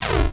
fire Hit

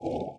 Paddle

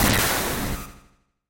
Mech Crush

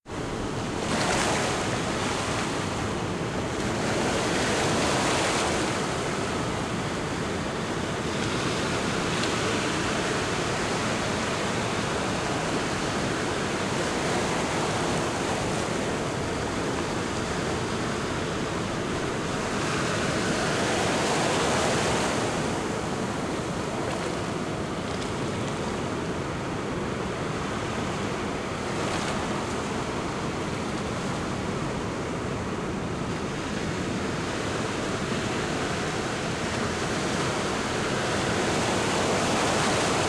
Ocean Surf

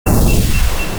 missile launch

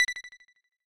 Menu Cursor Move

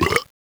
Spit

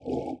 Paddle

